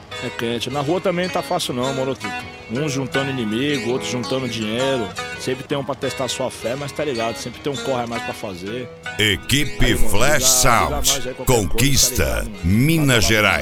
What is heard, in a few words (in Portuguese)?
rap; cd rap flash sound